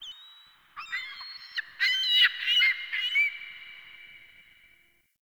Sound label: Beach Bird Distant; Midrath Beach